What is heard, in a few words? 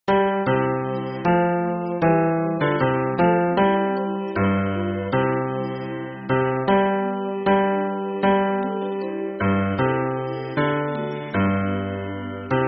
hymn bass